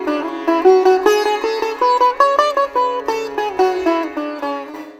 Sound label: SITAR; Spectrasonics; Heart of Asia